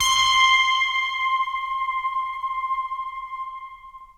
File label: CAT Big City Verb Vinyl Synths C5; vinyl leads